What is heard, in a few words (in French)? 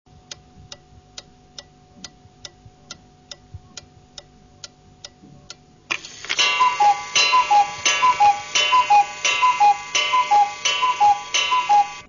coucou